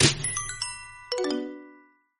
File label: jingle deal again